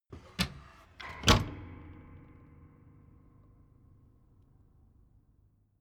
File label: door flap loft close